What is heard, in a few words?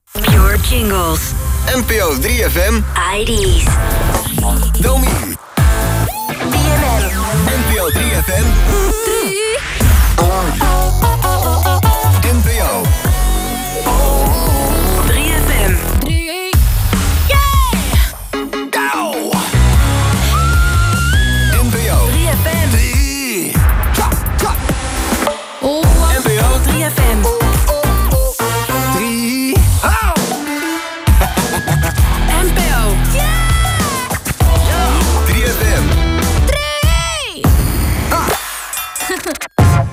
Jingles; NPO 3FM PURE